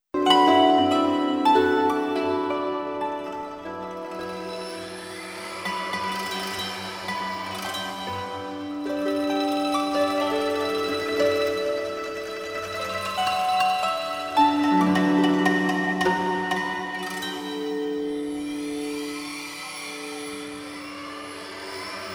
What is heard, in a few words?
Bell Fantasia; Wind Melody; Solo premiere concert